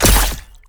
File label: multi sword